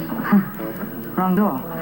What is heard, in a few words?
door